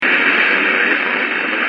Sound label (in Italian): TELEFONO; METAFONIA; VOCI